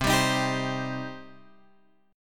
C Minor C down Guitar Open F; strummed; JGuitar

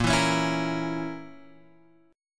A sharp Diminished, Guitar, Open G

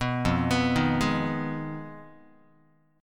F Minor 7th Flat 5th, Guitar; arpeggiated; JGuitar

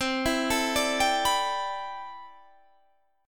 A Minor 11th C, Chord

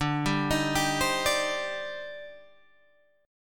Eb Major 13th D, guitar

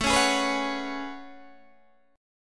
A Major 13th, Guitar, Open D; chord

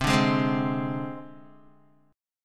C Suspended 2nd Sharp 5th C Guitar; strummed; 4F,4A,5C,5G,5C,6E; 7,5,8,5,8,x; JGuitar

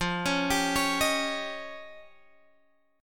F 7th Suspended 2nd Sharp 5th, Guitar, Drop D

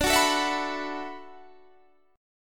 B Minor 11th D

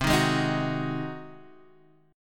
G sharp 7th C

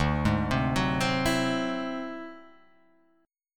D 9th Flat 5th D down; arpeggiated; JGuitar

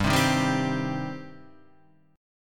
G Suspended 4th Sharp 5th G, Guitar; Gsus4#5 Chord {3 3 1 0 1 3} strummed

